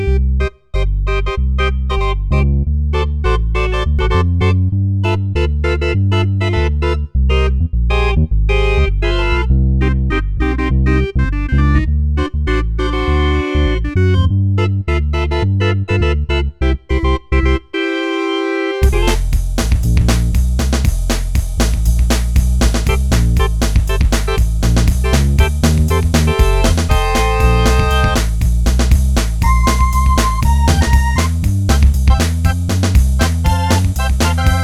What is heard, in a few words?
Combo 68, Korg Radias-R